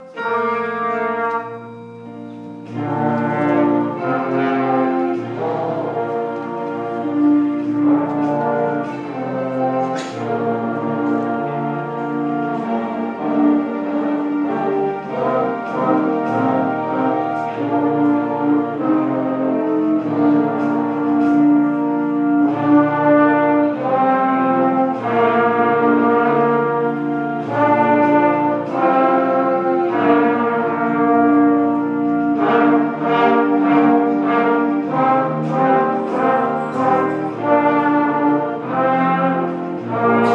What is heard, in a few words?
junior brass; only started in Sept 2012